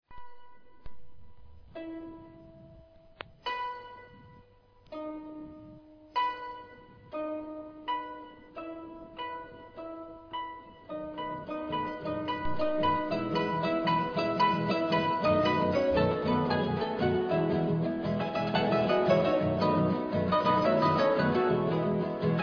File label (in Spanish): pajaro campana polka